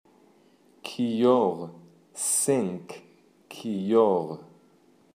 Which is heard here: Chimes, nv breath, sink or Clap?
sink